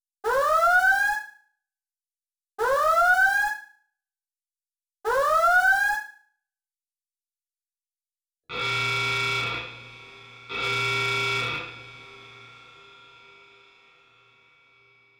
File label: red alert